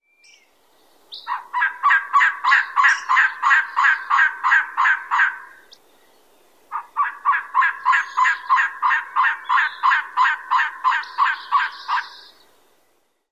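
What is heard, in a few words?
call, Elegant Trogon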